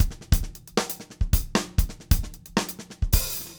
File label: 133, drum